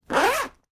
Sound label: zipper bag